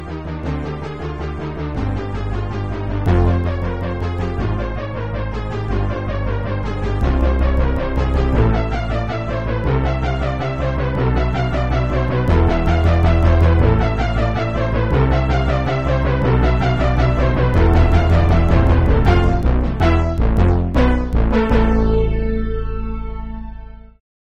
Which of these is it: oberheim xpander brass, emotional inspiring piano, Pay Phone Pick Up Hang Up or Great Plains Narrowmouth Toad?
oberheim xpander brass